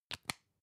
lipstick close soft; haus-garten; badezimmer

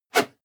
whoosh whistle fast hard; swooshes-swishes

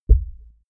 heart beat single high; comedy-cartoon; koerpergeraeusche

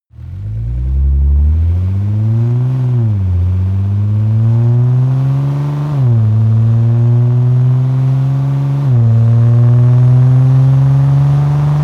car nissan gt-r 35 acceleration medium

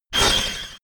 sci-fi laser shot down; soundpool

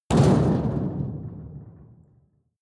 metal impact sheet rumble rattle medium; hits explosionen; metall

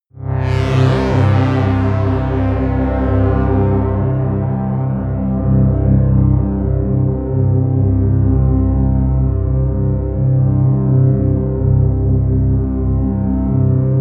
pad sweep flanger; flaechen

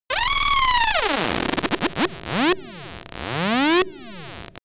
high voltage

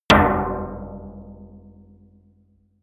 impact sheet metal thick; maschinen; soundpool

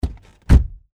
dodge door open close inside

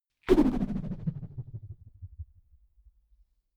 whoosh rubber band vibrate; swooshes-swishes

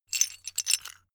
metal pieces clatter short

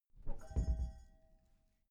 glass door close soft glass clang

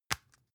spice jar close short; industrie wirtschaft; gastronomie

